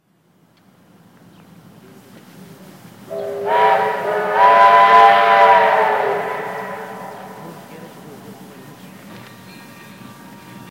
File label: Star Brass 5 Chime Step Top